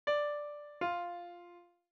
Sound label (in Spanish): Intervalo Disjunto